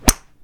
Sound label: Golf